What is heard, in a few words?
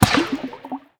Bird Enter Water; angry birds rio